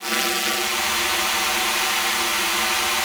WEQS C#3, ALTOSAX; Xsample VOL-2

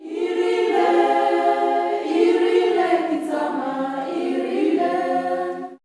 GIRL CHR, FEM. CHOIR; Spectrasonics; Heart of Africa 2

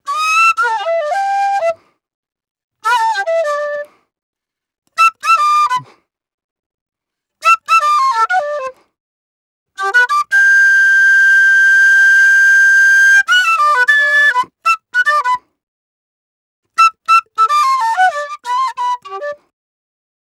Penny Whistle, African; Quazibeat; African Secret

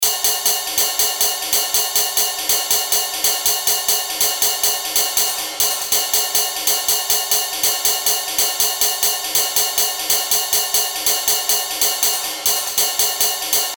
Ride, Cymbals; Industrial

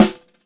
SONOR snare

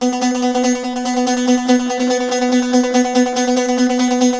SAZ; Spectrasonics; Hans Zimmer Guitars VOL-2

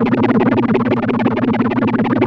Korg PS3100 A#3; Back In Time Records; Korg Universe VOL-1

